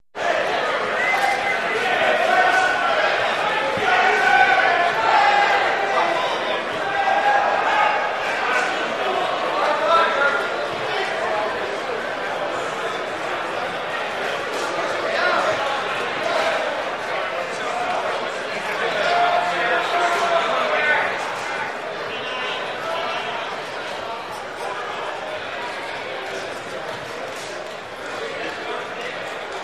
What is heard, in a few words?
Stock Exchange Crowd